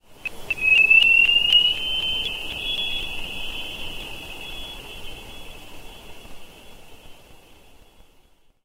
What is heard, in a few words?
Sonar Sweep; Barotraumatic; Carrier Leviathan